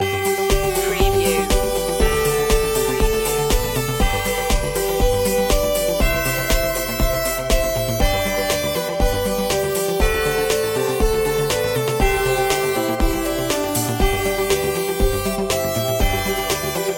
auld lang syne synthified

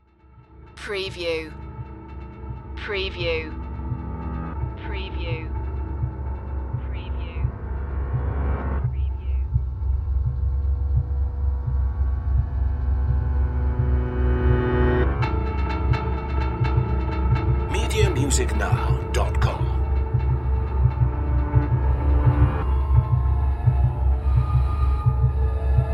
dark ambience

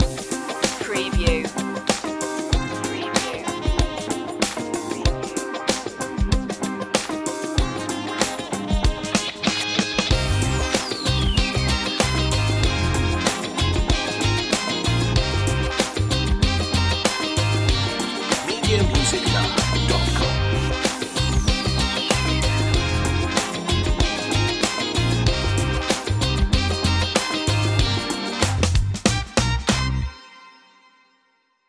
forever funk

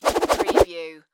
Knife Whoosh

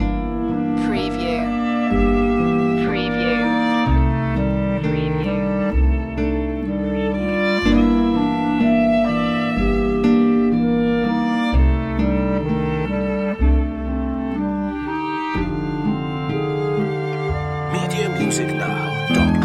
winter melancholy loop

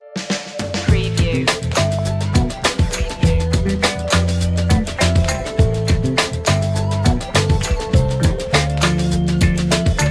get funky

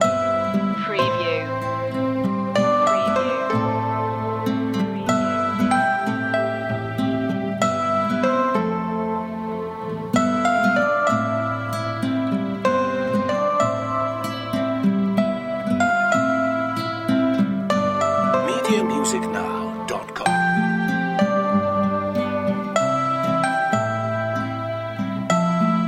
dreamy bells

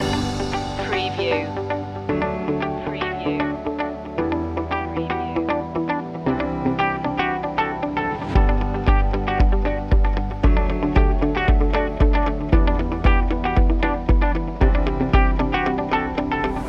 easy corporate loop

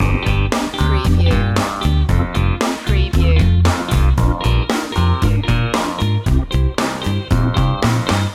playful wah wah loop

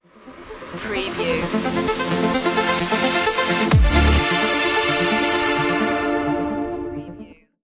beat the grind stinger

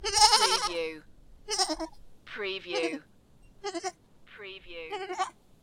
Goat - Baby Goat Bleat Five Times

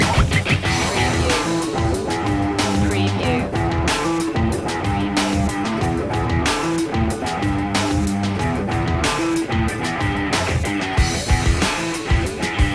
no pain no gain loop

